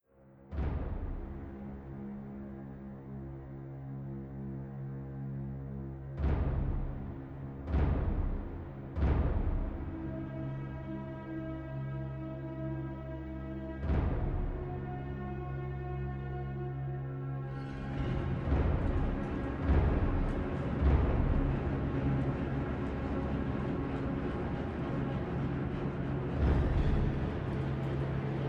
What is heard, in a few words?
Strange Atmo Production Music